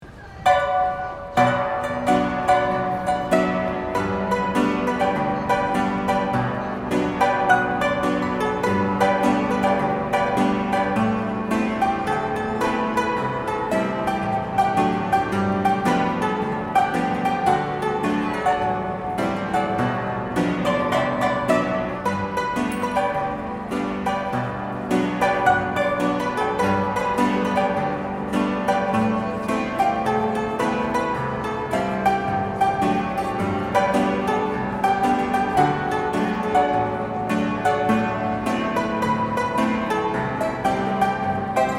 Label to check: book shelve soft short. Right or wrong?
Wrong